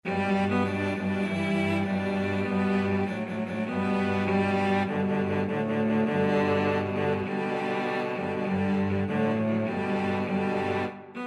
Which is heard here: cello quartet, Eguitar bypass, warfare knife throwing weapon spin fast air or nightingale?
cello quartet